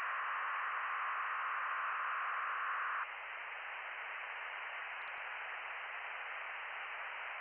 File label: from radio, 7177KHz